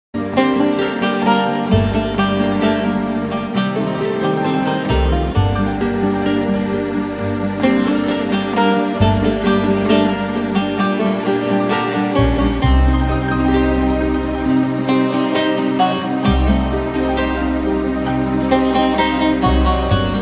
saz

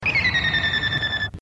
warning beep; ringtone; gundam

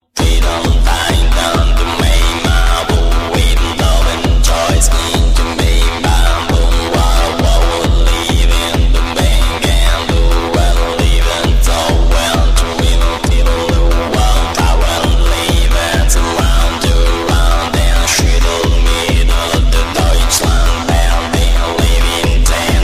German

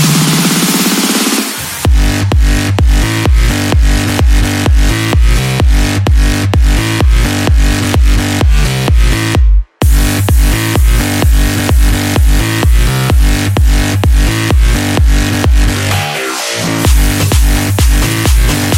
dubstep

